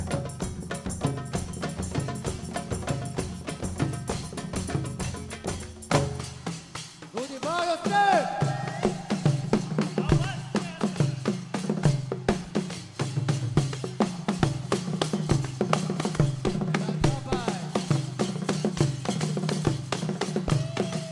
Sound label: drum Song; Arabic; World Music